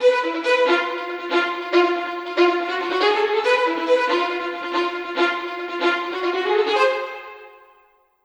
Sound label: violin; скрипка